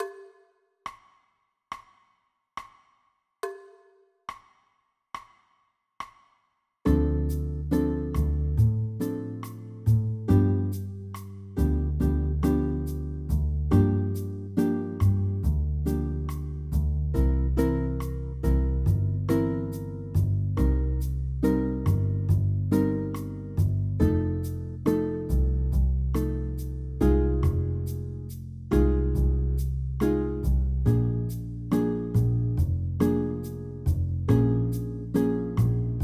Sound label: II V 12 key, jazz piano